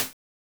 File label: Hi-Hat; Genesis